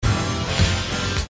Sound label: Opening chords